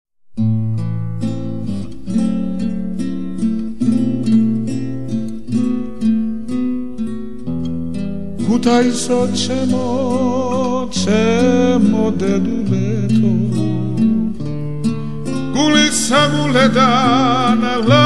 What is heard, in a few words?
relaxation music